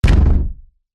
human body fall hard thud

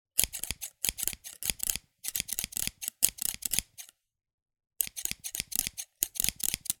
haberdashery scissors cutting; zapsplat

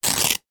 cloth polyester running shorts rip; sound effects one

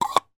toy plastic cups x2 stack into each other; zapsplat

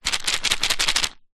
seed pod shake flamboyant tree; foley

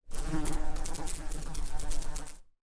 insect fly buzz around close up

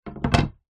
sport snooker cue set down on table; zapsplat

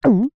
cartoon gulp swallow nervous

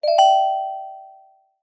alert chime ping med pitched

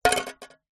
foley tin metal lid down on surface; zapsplat

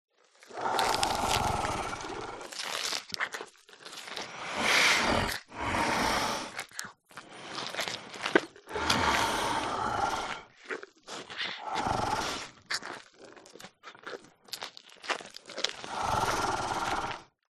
dinosaur herbivore eating plant; animals

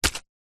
horror stab or punch into fleshy guts

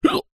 human male hiccup single

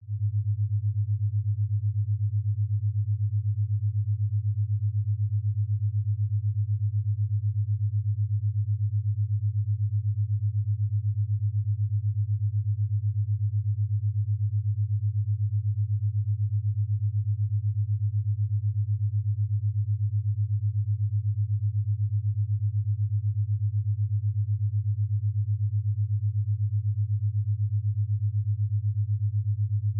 binaural beats 100hz 8hz theta waves

